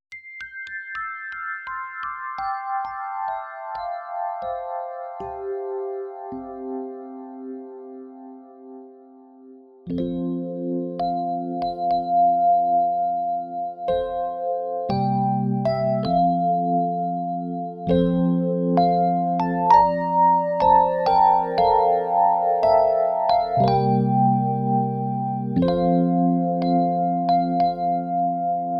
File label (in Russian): marsh vagnera ksilofon